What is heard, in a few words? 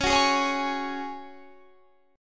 Db Suspended 4th, Guitar, Standard; strummed